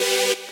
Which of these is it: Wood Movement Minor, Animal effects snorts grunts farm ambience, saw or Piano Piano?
saw